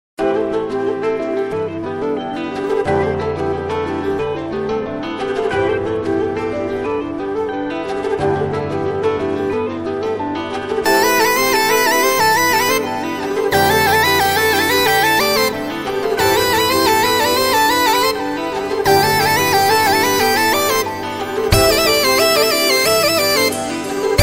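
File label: Instrumental; Khatu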